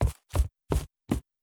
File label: Walk Loop